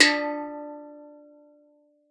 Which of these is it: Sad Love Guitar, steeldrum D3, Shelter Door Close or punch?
steeldrum D3